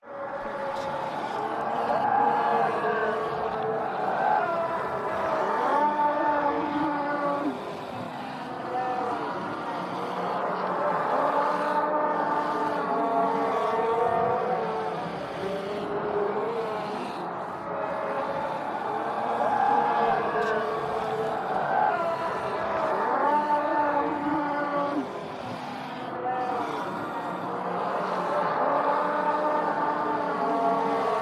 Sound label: zombie; sfx